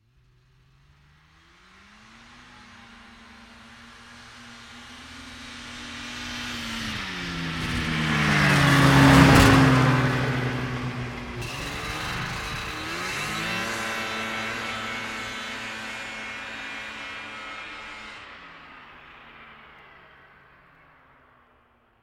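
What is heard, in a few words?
Snowmobile 550cc Engine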